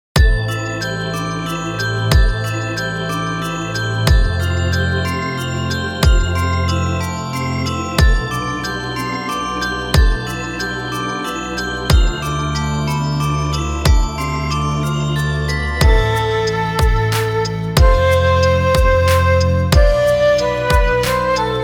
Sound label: mountain Bell